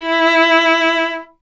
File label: viola E; Miroslav Vitous; Solo Instruments VOL-1